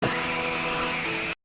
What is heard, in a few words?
scream; among us